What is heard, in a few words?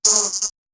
sound of net catching a; insect